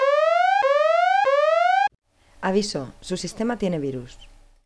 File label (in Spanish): alarma